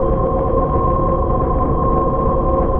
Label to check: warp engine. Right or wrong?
Right